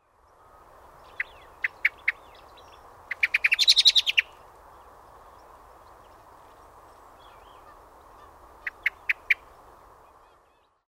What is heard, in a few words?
blackbird